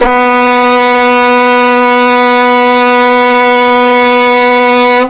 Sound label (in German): Horn lang